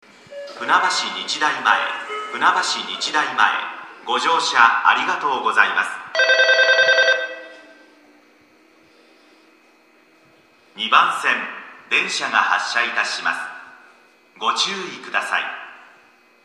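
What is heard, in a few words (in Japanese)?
駅放送, 到着発車 音量が大きい; funabashi-nichidaimae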